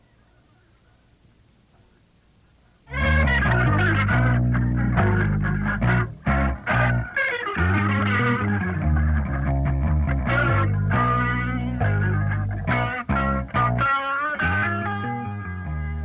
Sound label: Instrumental